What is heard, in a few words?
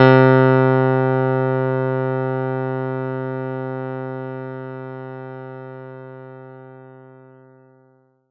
Kawai K3 Piano C3